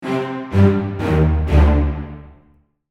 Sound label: String ensemble descending horror tone